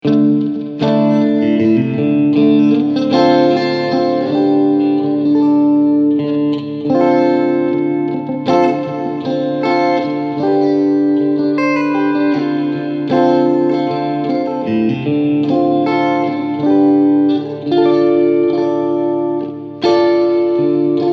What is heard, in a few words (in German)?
Reverb Pur